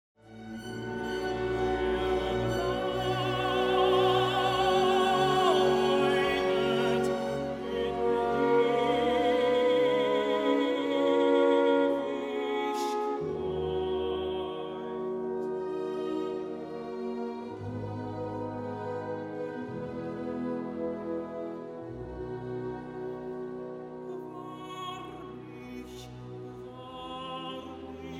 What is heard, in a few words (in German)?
Tenor, Wahrlich, ich sage euch; Erntefeier